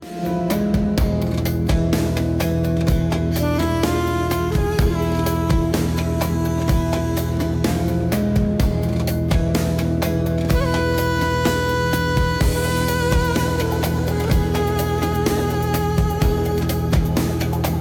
measured fight

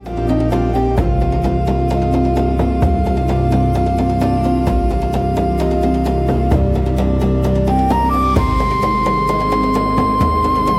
mysterious nature, puzzle flow

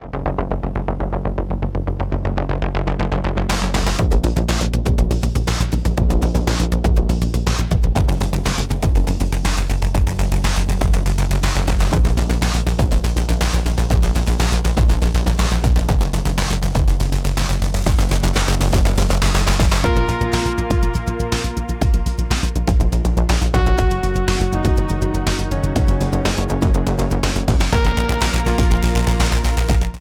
technological ruins

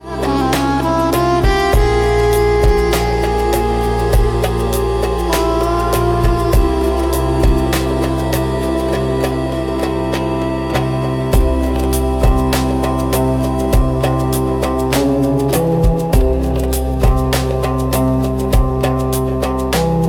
desert haze

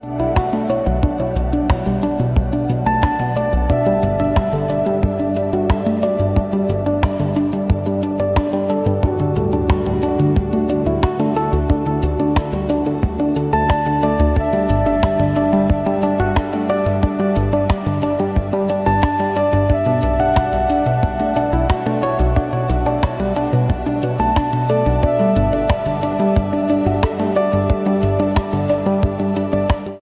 idle harmony; puzzle flow

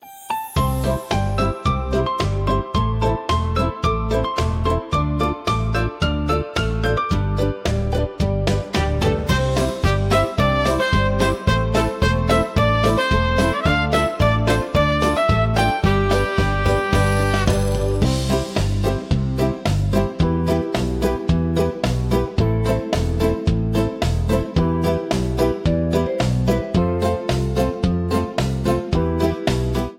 peaceful happiness

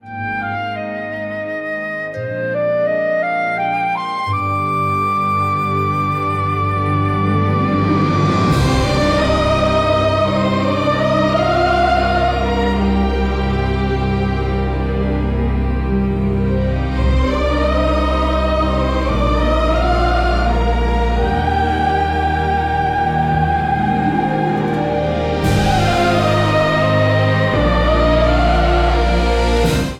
elfin touching ballad

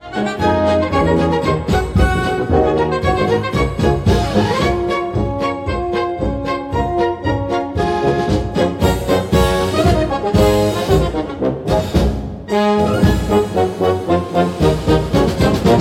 amusement park

